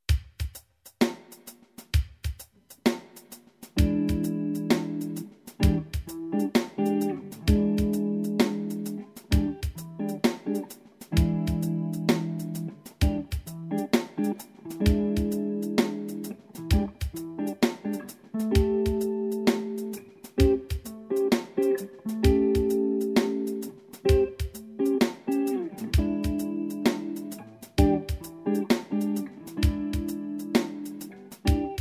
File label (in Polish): akordy hendrixowskie w bluesie, gitara